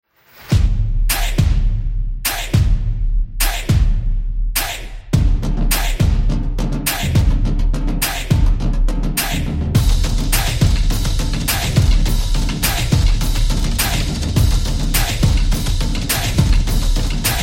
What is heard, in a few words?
This Is Sport Percussion